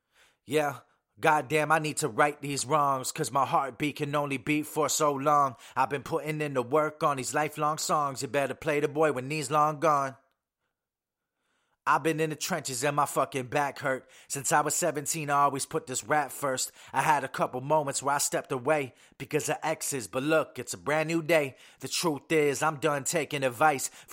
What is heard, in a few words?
HH, Acapella, 100, EbM